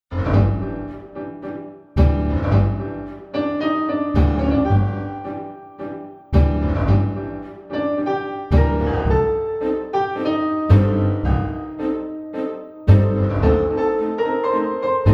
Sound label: decaf tango